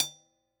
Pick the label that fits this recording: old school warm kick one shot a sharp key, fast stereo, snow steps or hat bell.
hat bell